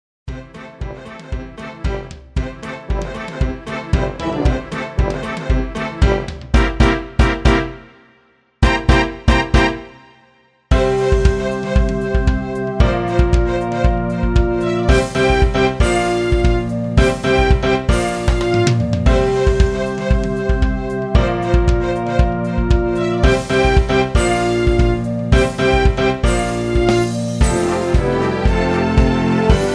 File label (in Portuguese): trilha sonora game infantil